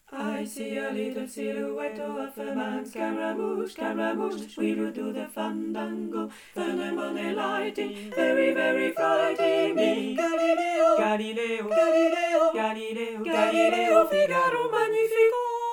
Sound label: tutti, chorale